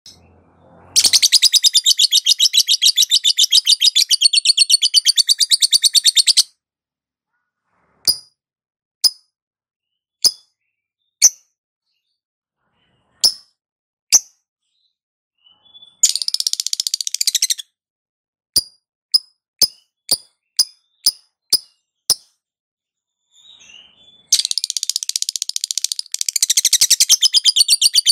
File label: lovebird